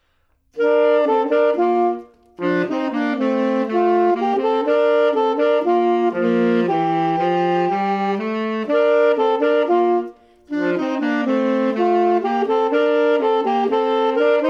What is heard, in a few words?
Saxophone Duet